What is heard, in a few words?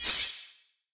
anime sword swipe up